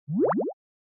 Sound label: Anime Motion Low